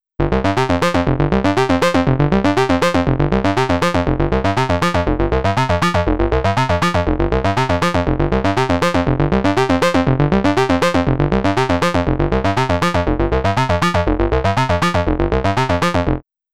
ring modulator

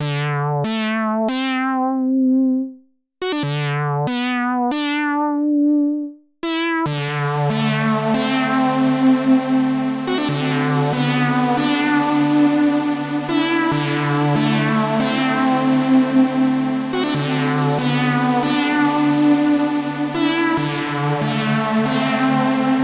analog synth; droplet

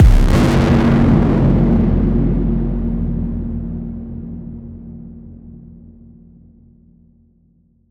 future bass house loop

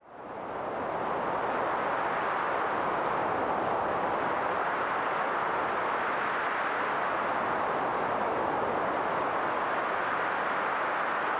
stormy beach

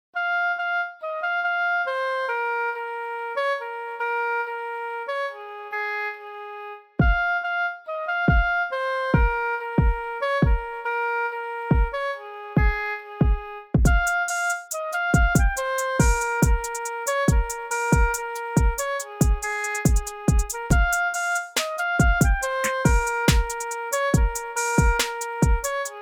oboe; ahoy matey

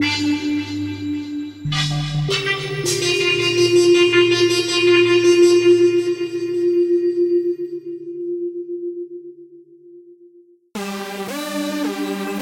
quartet

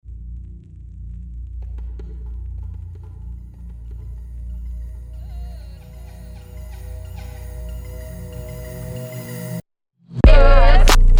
beat

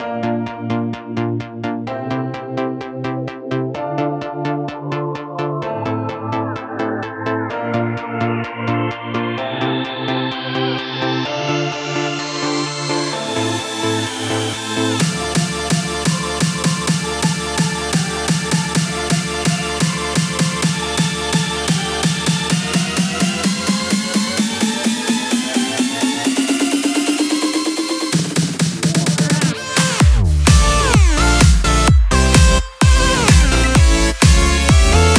edm

